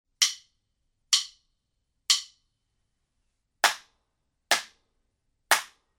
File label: studio ceiling before